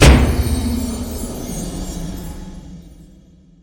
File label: mediumwin; netent